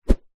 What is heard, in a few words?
stick snooker cue swing air whoosh